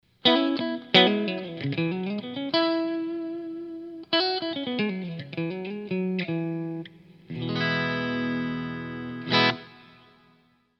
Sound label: delta bridge middle, strats; pickups